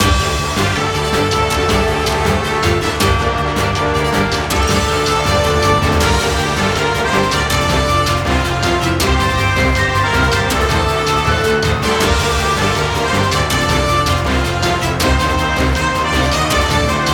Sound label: Battle, bgm